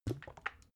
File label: bottle syrup upend soft; berufe; gastronomie